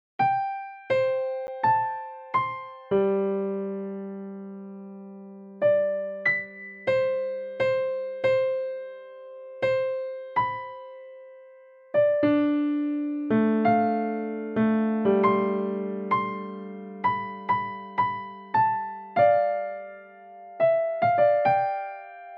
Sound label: Virtual Piano